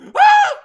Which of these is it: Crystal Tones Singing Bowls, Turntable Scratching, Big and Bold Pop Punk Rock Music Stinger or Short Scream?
Short Scream